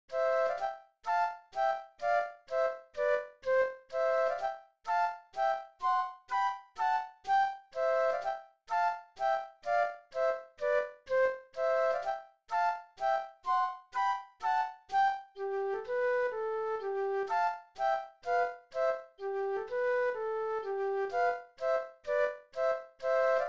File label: march flute duet; classical; Schumann